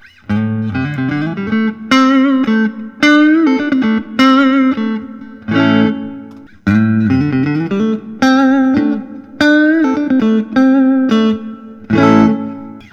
suhr classic s custom 84 fiesta red

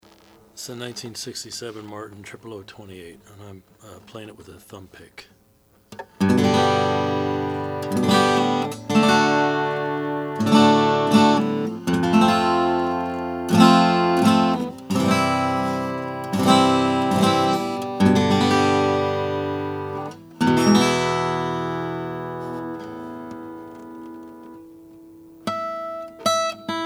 martin 000-28 natural

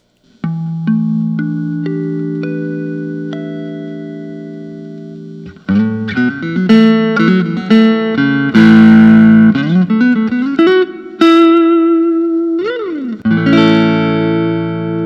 fender custom shop 59 telecaster

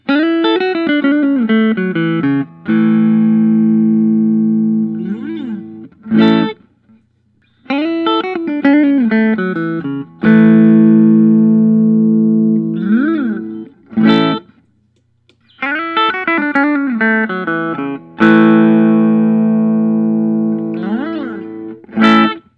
collings i 35lc; faded cherry

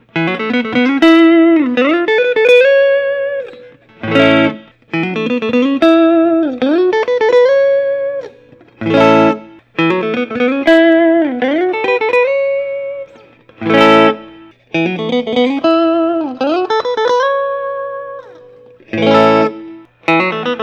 fender custom shop wildwood 10

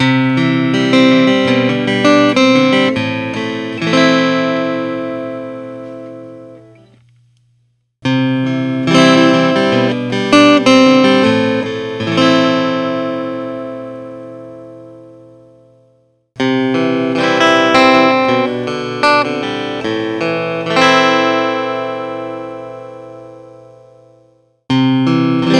taylor t5 c2 koa; edgeburst

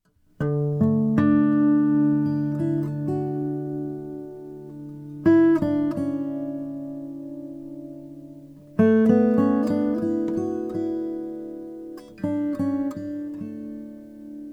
Huss Dalton guitar custom 000 12

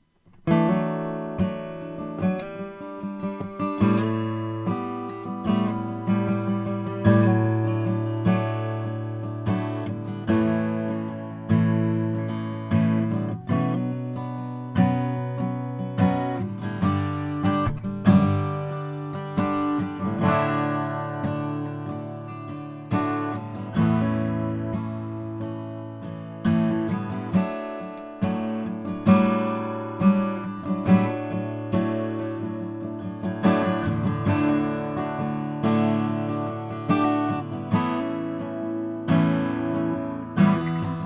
bourgeois sj prototype

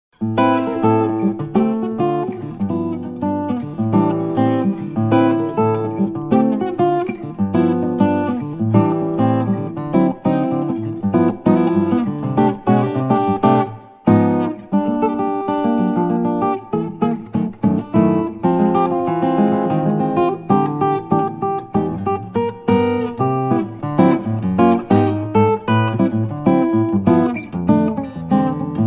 froggy bottom deep body 12 fret 00

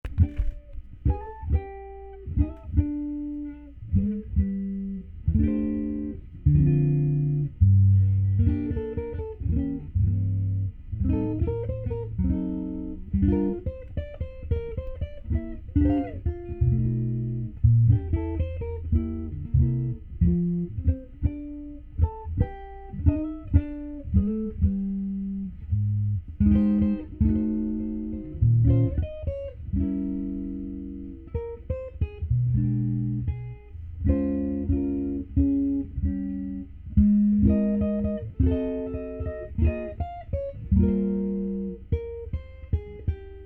peerless imperial

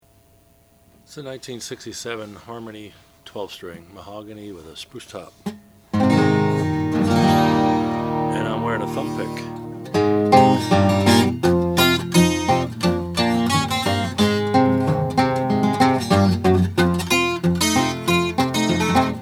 harmony h1270 natural

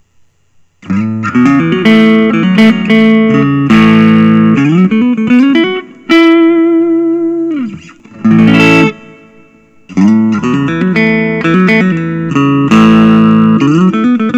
Gustavsson Bluesmaster custom 5

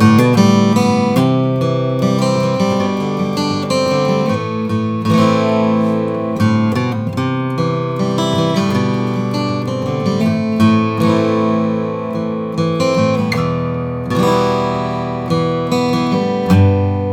McPherson camrielle 3 5 englem nat

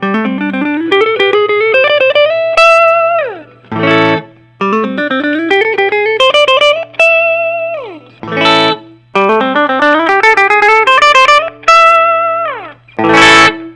thorn deluxe brazilian; light brazi

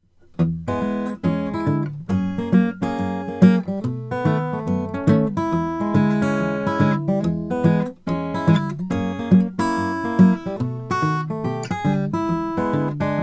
Martin D 41 indian rosewood

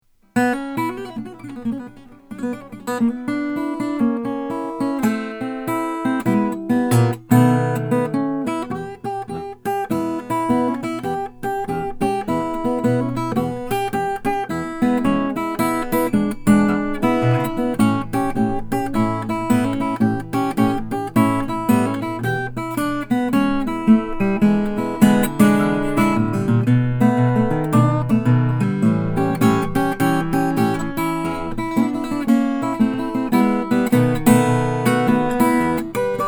Bent Twig Sapling parlor torrefied top

